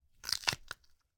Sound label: gore, bone break and flesh tear dry, soft; Timothy McHugh; Gorification [HD]